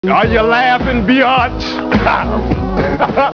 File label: laughing